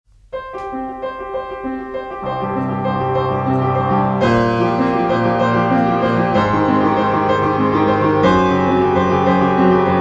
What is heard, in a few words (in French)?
PRELUDE 1 EN DO MAJEUR